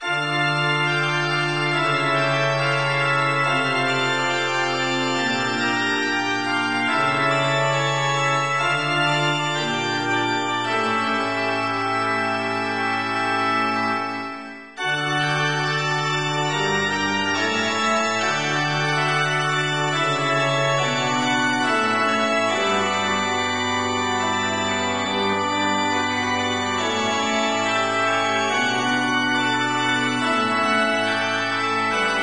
Church; MapleShrine

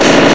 mgun; MapleShrine; mkxp; gameasync

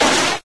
reboot; MapleShrine; mkxp; gameasync

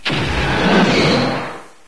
dash; MapleShrine; mkxp; gameasync

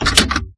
Switch; MapleShrine; mkxp; gameasync

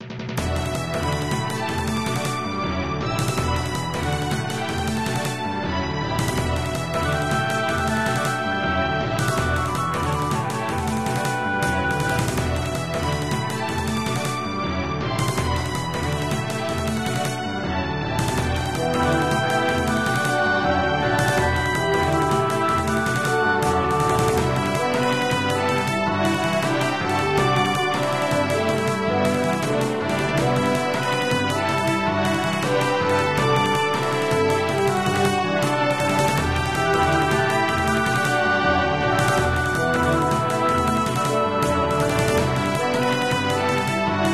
Battle; MapleShrine

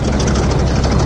car; MapleShrine; mkxp; gameasync

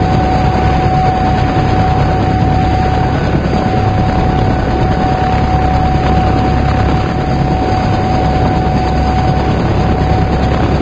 vtol; MapleShrine; mkxp; gameasync

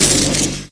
Thunder; MapleShrine; mkxp; gameasync

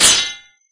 Attack; MapleShrine; mkxp; gameasync

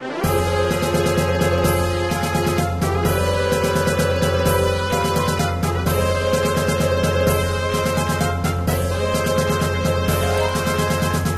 Negative; MapleShrine

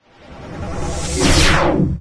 Teleport; MapleShrine; mkxp; gameasync

